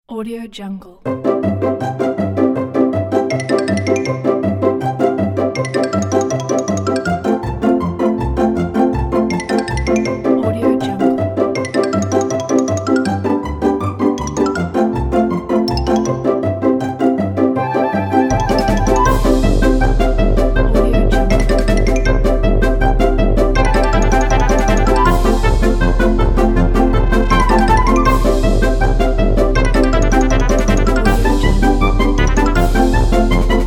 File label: funny cartoon children